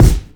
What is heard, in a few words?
treble rich kick sample f key; kick drum samples